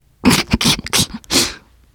laughter, teen girl

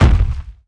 walk, footsteps; tank

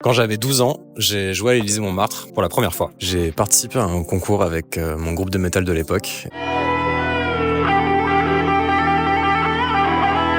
electronicmusic livemusic

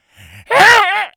shout, infected; npc, alert, becomealert

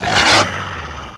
zombie dog attack

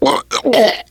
choke, survivor voice, biker

